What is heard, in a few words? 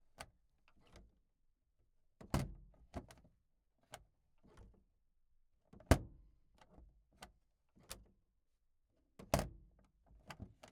Volkswagen Van, Glove Compartment; 1978